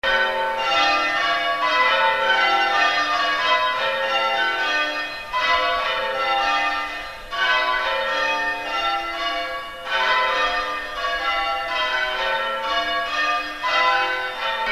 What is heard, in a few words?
church bells